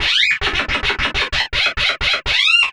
LAUGH, Anime, COMIC; Sound FX; AKAI Anime Sound FX

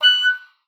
oboe, F#6; Xsample VOL-6

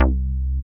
BAS, Eurotech; Sounds Good

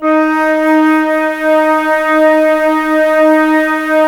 Flute, Woodwind

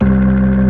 C1, POWER B3; Northstar - New Gold VOL-1

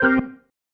Stab One Shots, Organ

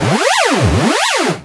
hypersynth siren

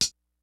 FUNK, DRUMS; Kid Nepro; Elite

